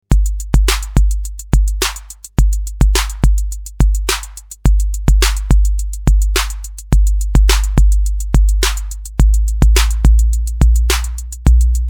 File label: bassdrum; drumatix